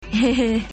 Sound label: ehehe